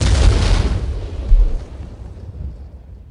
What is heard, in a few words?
thud; Soulforge Tower Defence